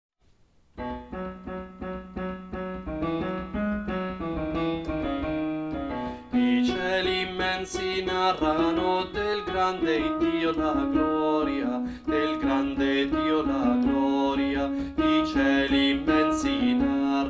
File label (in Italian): Soprani, coro; Coro Isola del Giglio; Natale; I cieli immensi narrano